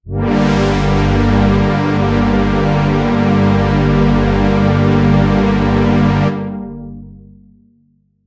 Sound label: pad naked